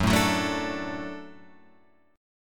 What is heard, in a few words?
Gb Minor Sharp 5th Gb down Guitar Open F; Gbm#5; strummed; JGuitar